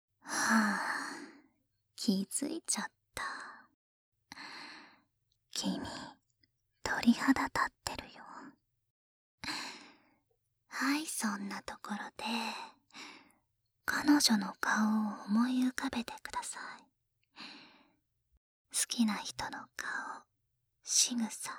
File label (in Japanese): 耳かき左